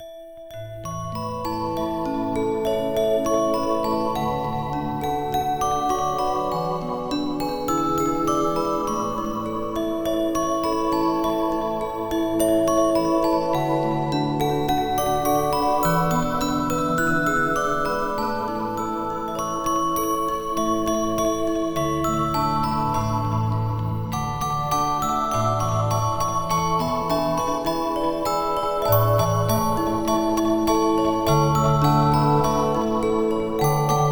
Instrumental Version Christmas Xmas Jingle Bells Beautiful Bells Instrumental